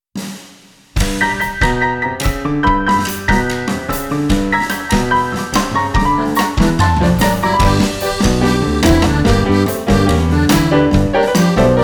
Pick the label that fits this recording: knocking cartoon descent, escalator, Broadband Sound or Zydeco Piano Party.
Zydeco Piano Party